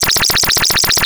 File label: Arcade Movement; Video Game Sounds; 1001 Sound Effects